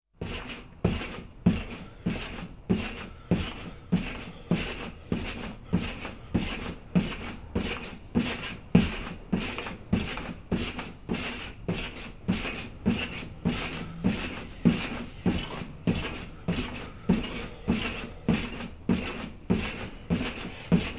Trampoline General Atmosphere; KMP Film Video Sound Effects

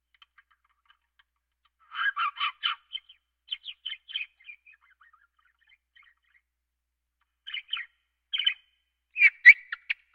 Birds

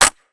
Musket Foley; Sound Ideas; Guns

